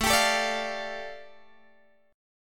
A 7th Suspended 4th Sharp 5th A Guitar Open G, chord